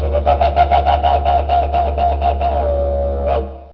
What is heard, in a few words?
didge